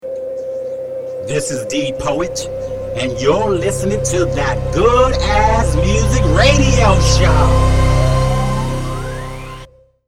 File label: Radio Show Intro